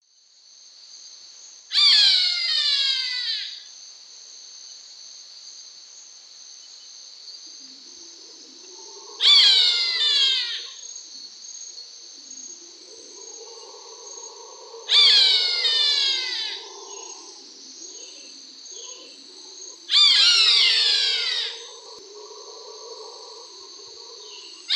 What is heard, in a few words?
Rostrhamus hamatus, calls; S. America; Eastern Lowlands